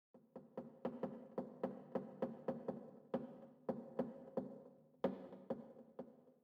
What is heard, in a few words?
sting tree creak; Rust